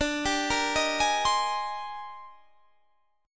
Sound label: D sharp Major 13th, D, guitar chord